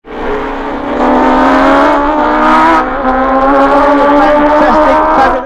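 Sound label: Gillera, motorcycle; 1957 Gilera 4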